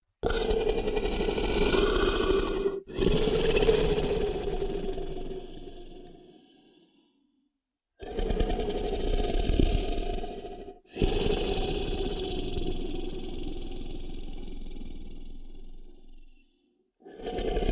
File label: monster snore vibrate; comedy-cartoon; koerpergeraeusche